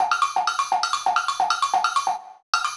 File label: Wood Block